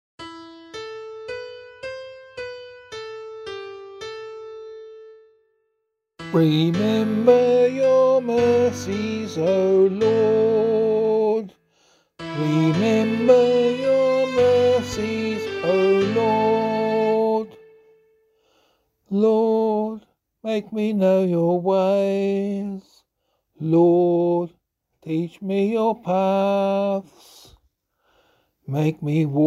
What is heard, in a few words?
Psalm vocal